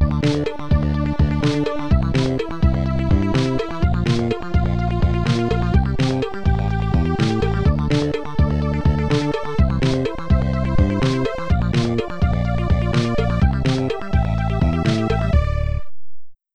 Level Complete; qix amiga

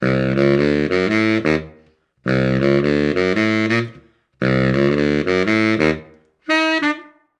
Bollywood End

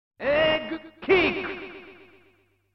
Egg Kick

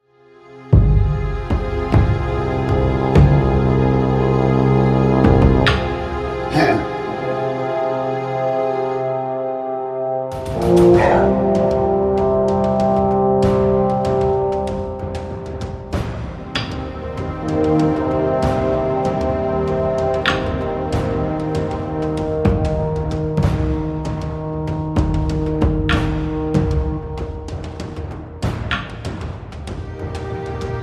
Menu Music; BAR

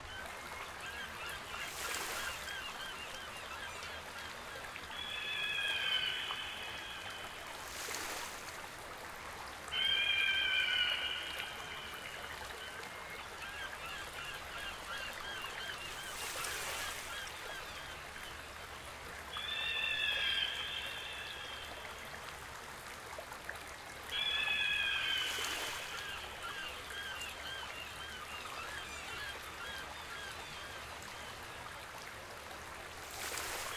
Ocean Sound

